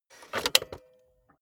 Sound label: cassette player slide tray insert soft